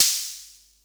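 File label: SR95 snare drum; Best Service; Dance Mega Drums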